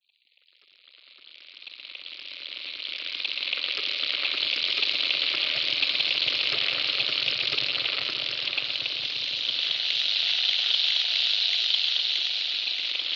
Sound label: Ambient music - suspense